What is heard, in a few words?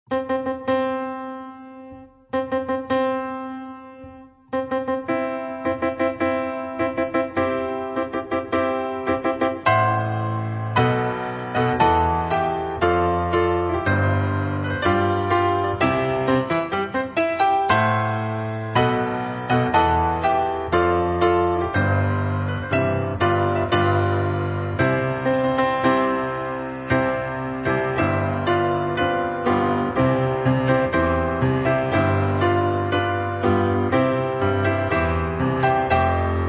Wedding March